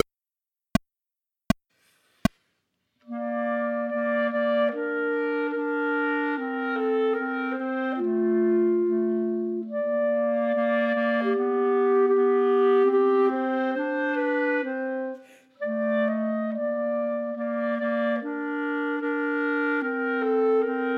Entracte Chamber Music for 3 Clarinets minus 2nd part; Schubert